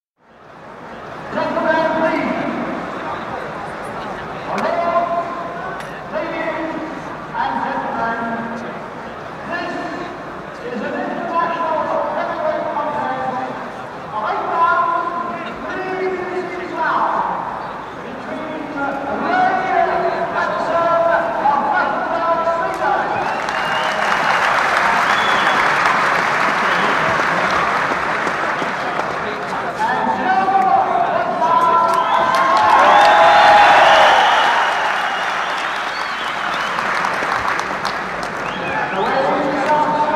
Announcement into 1st round - bell at end of round, into atmosphere, seconds out, bell, into 2nd round - fairly quiet contest; Miscellaneous Sport Sound Effects; BBC Historical